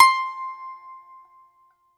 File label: BAGLAMA, Plucked Strings